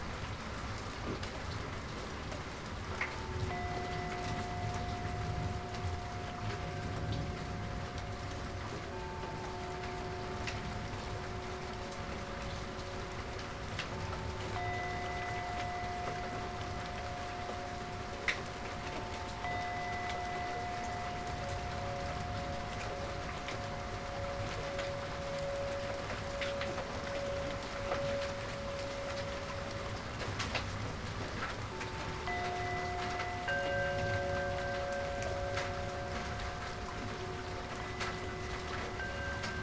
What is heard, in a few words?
Soothing White Noise Rain Sounds